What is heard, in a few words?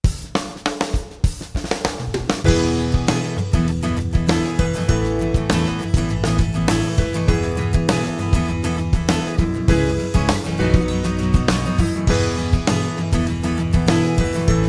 Acoustic Power